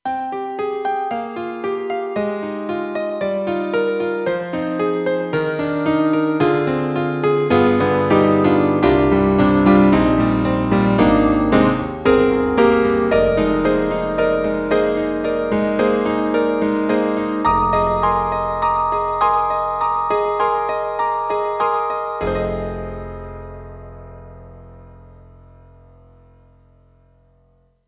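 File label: grand piano, KAWAI GMega LX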